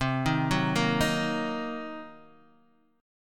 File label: C Minor 7th Flat 5th Guitar Open D, chord